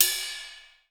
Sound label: RD, Casio CTK-5000